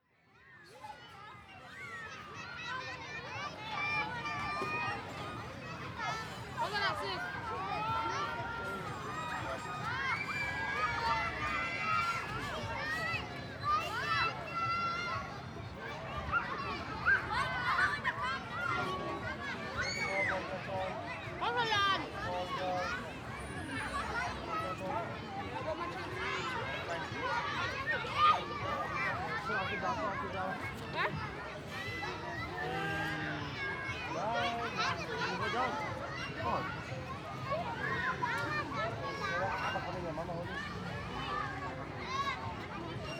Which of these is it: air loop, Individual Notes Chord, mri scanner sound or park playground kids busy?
park playground kids busy